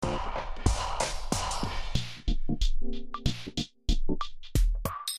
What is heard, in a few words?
Funky Hopper F7 93